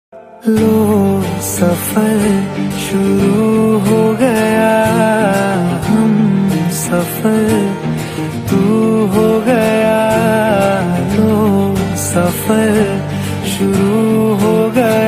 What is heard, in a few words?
hindi songs ringtone; baaghi 2; bollywood